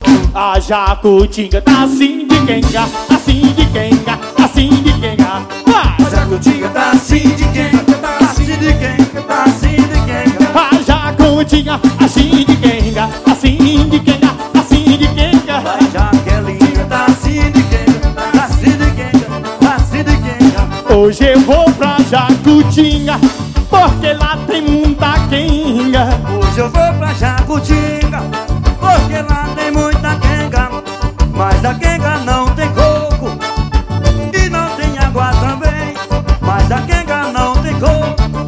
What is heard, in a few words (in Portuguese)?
pe de serra